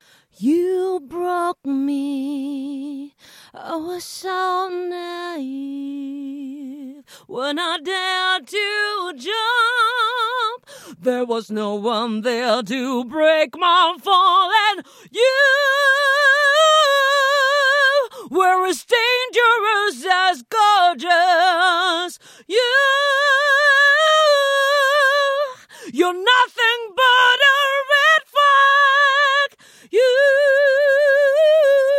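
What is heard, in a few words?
EDM Dance pop 130 F sharp m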